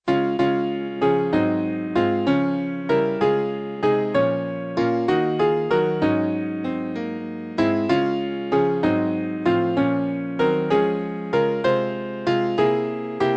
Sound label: music; xmas